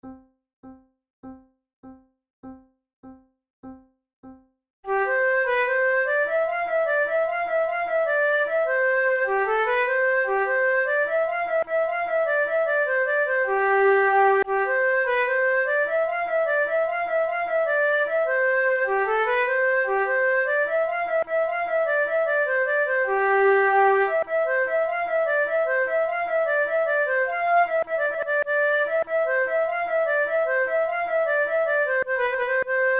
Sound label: gigues; Naudot; 6 babioles